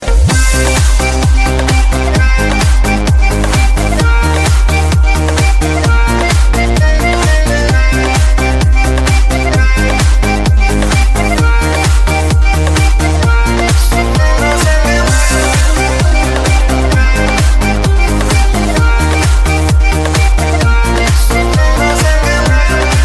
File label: rocking dj music ringtone